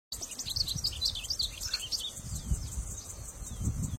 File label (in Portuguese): Canto do azulinho